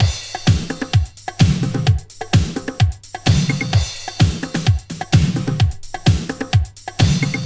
129 bpm eighties drum beat C key, Free drum groove - kick tuned to the C note. Loudest frequency: 1227Hz